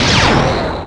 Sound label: psionic blast